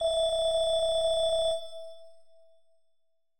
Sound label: phone incoming call; stereo